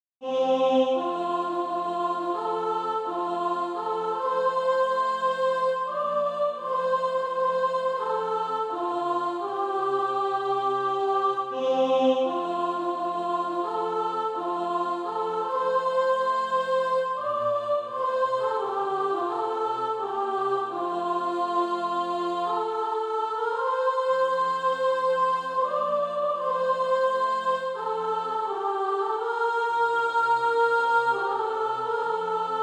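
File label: soprano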